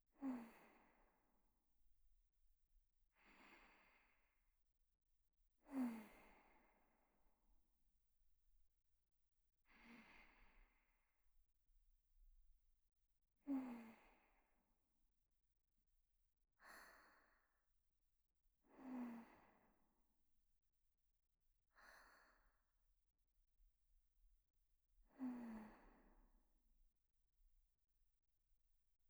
寝息ボイス,ASMR; DayBreakSweet; 桜

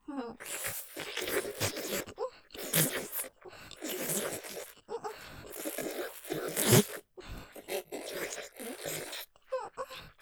フェラ音マシマシ・絞り取るようにバキュームフェラ; おかずにスパイス２; 勢いマシマシ

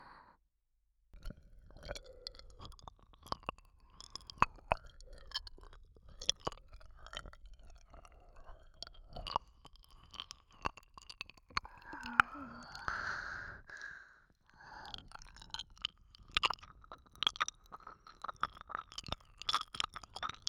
耳舐めのみ

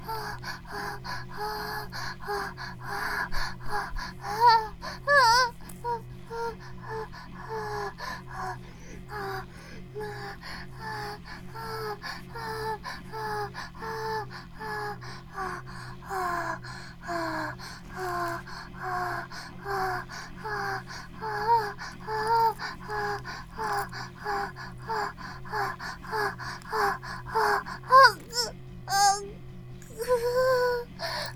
バイブ放置, ASMR

